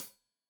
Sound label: Hat Tight, Hi-Hat; Deeply Sampled Drums